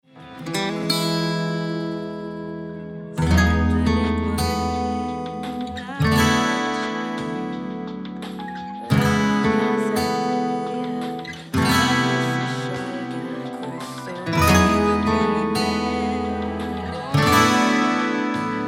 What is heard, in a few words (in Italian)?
Acustiche